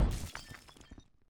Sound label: glowstick; Tools; Barotrauma; EK modern